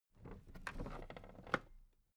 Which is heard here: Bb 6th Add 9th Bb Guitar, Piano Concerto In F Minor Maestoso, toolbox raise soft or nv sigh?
toolbox raise soft